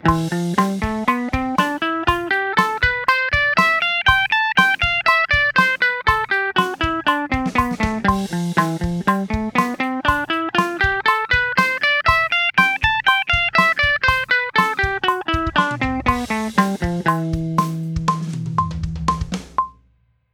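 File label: Am #5 Phrygian bpm120 eighth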